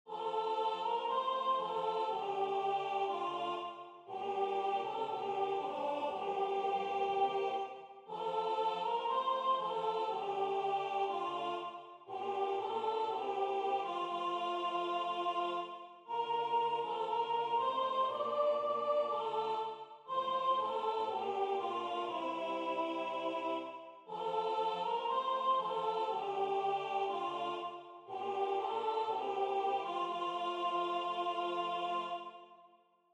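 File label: In The Bleak Midwinter soprano; Waiheke Island Choral Society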